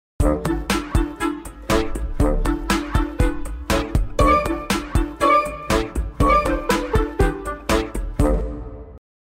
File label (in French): Enfant histoire link; Jingles medley